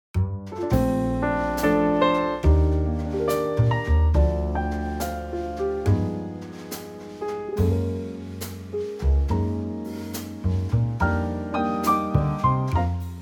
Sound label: Bb Trio